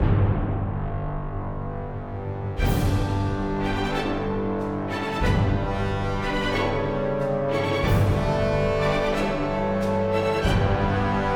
Cinematic Music - Victory

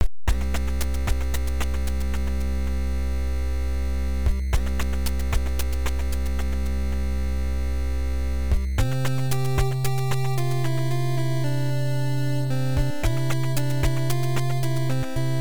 Anthem; top gun; nes

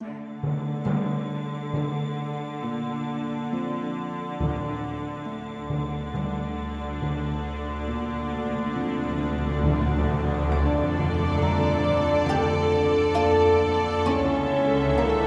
Loop Tense

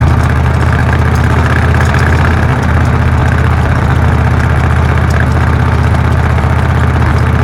V8 Engine; bad piggies; android; mobile; windows

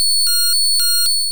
Screech

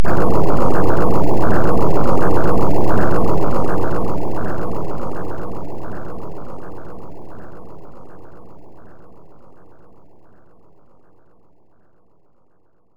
Loop Earthquake; rpg tsukuru dante98 ii pc-98 1996

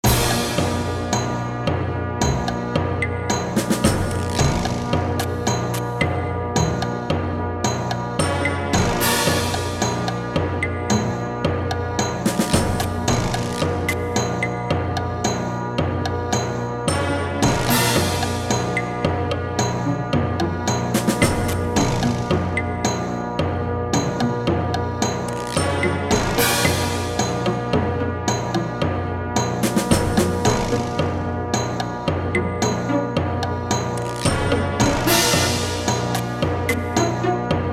Clock Tower; beyond shadowgate; Icom

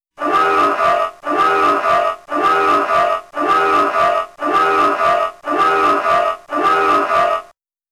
Warning

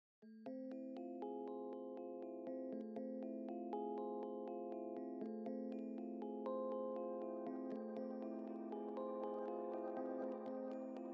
Synths & Keys

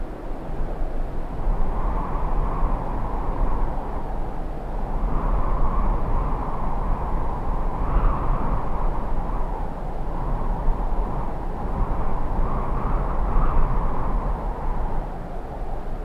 Ambient Outside; Among Us

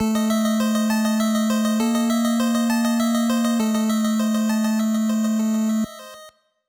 Scene Clear; Teenage Mutant Ninja Turtles II The Arcade Game

